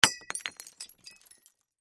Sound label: Crash Glass Brandy Bar Drop Break; Sound Effects:Materials; Blastwave